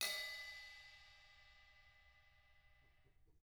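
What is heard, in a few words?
CRASH 17 BELL; ILIO - Double Platinum Drums 1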